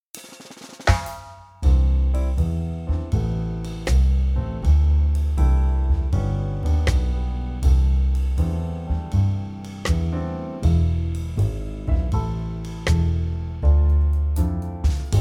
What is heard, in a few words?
backing 80bpm short